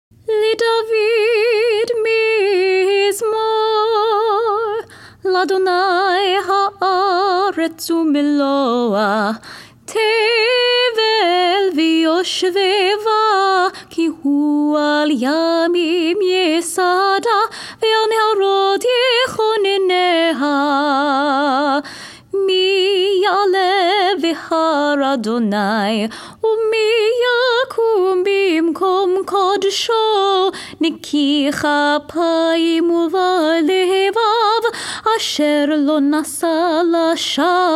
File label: psalm 24 congregational melody weekday